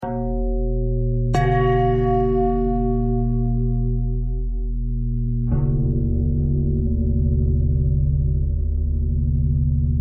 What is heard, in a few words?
bell bend threads